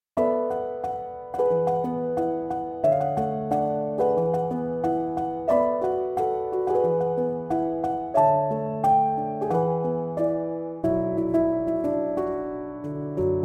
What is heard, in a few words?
piano instrumental